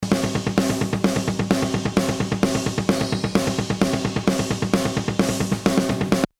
Metal Snare; Pulsar 1178

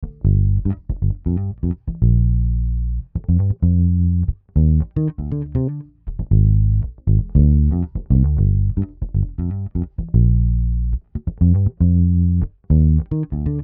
bass dry; smasher

